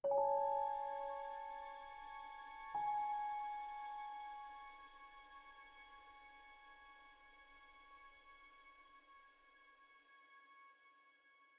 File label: Suspense Short; Pillars of Eternity